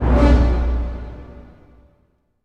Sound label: HIT, Orchestral; Orchestral Loops; 128 PSYCHO